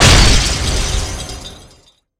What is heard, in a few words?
Ice break; GunCrasher